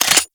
Heavy Shotgun Fire Mode Buckshot; Warframe; Tenno

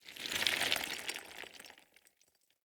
Smithy Rock Crumble; Ambience; Soul Plane

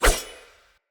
Throwing Knives Throw; Weapons; Pothia

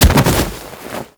Hoverboard Ragdoll Bodyfall; Warframe; Vehicles; Venus; Hit Effects

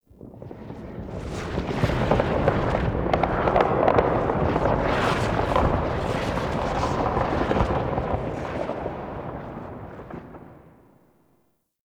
Wind Crackle Rumble; Tau

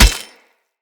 Hammer Impact Flesh; Weapon Impacts; Player; Combat

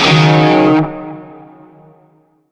Shawzin Yo Chord L; Warframe; Narmer Shawzin

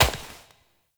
Step Flesh; Warframe; Koumei

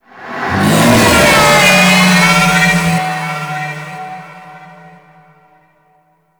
Vocal Pain; Warframe; Teralyst

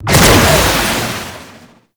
Jet Turbine Pistol Secondary Shot

